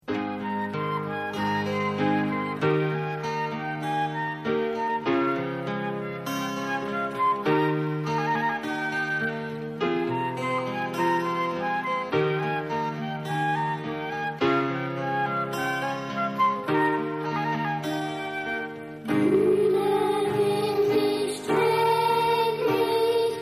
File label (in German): schlaflied